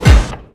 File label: biomech arm impact